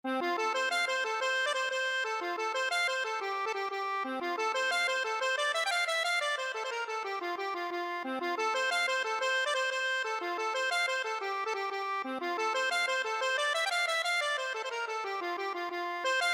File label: i will if i can, accordion